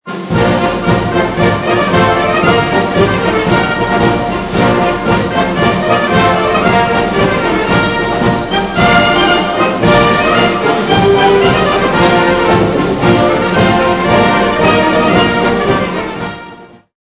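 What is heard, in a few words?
WIND MARCH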